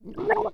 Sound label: Drowning, Humans; zps; HM Water